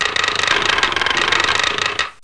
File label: new Build; Bible Fun Pack; Education Publishing Concepts; NOAH